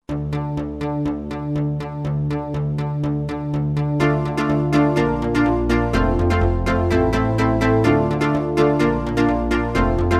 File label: Perkolator, Roland SR-JV80-04 Vintage Synth